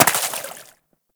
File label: Bullet Impact Water; Warframe; DE; Weapons